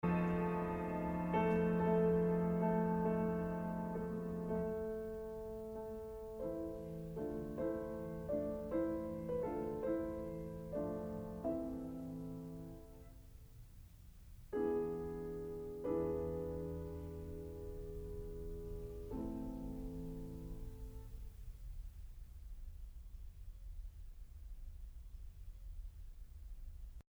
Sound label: Ballade no 2 in F major final statement; Chopin; opus 38